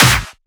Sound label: SnareClap; FLPRO